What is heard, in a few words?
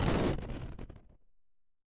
retro game explosion